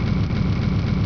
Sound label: down